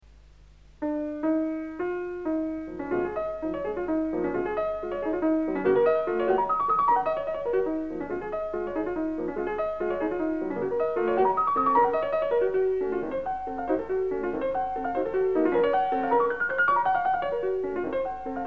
valse oubliee; Liszt; romantisme

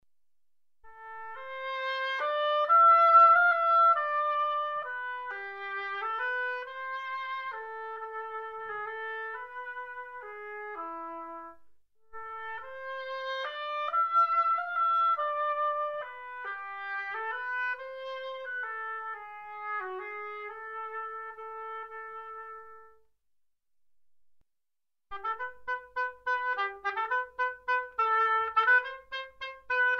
hautbois

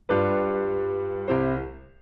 tension detente

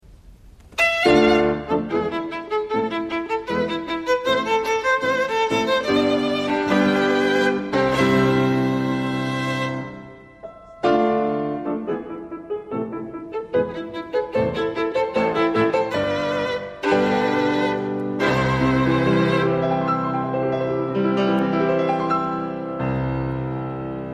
sonate violon; Beethoven

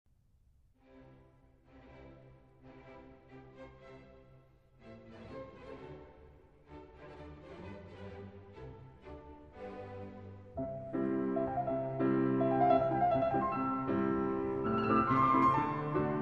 concerto piano; Beethoven

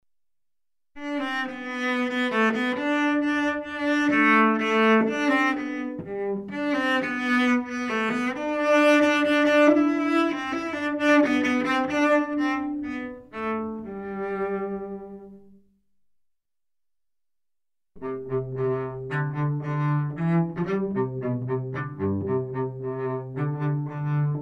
violoncelle

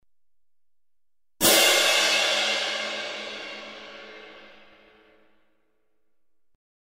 cymbale

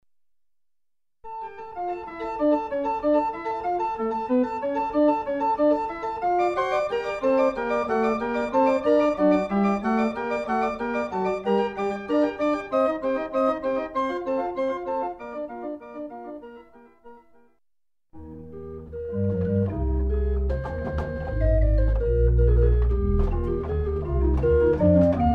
orgue

